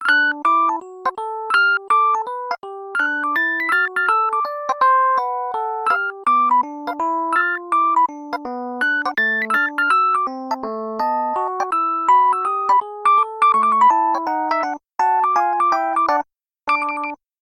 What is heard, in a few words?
Jazzy; LG Optimus Hub E510; ringtones